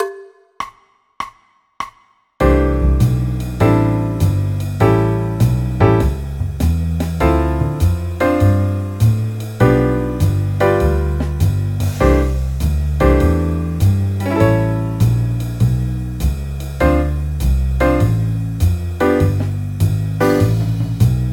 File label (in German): c dur akkordverbindungen